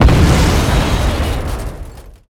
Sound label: Explosions Total Destruction of War Machines